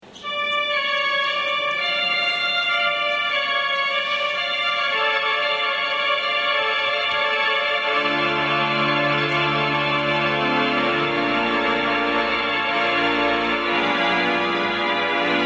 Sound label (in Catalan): orgue; Vendrell